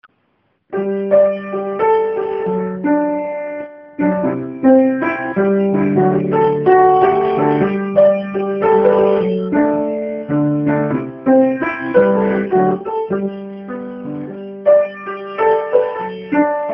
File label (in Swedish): polska; Marias